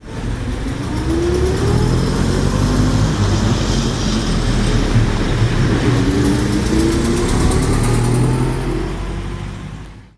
leyland lion lsc; simutrans; Pak128.Britain-Ex

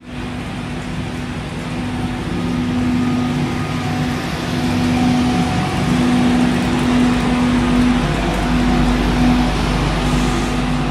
class 91; simutrans; Pak128.Britain-Ex

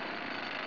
jackhammer; Simutrans; Pak128.Britain-Ex

